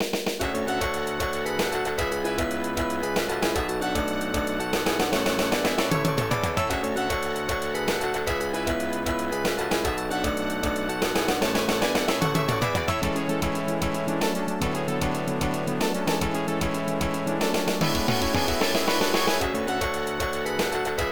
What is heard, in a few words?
Victory March; Lightning Fighters